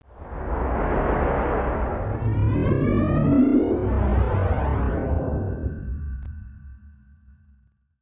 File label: mission ACCEPT; missions